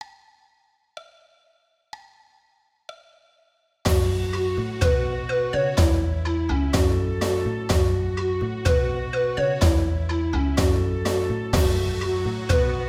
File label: This is an intense forest track designed for platformer games; Made in FL Studio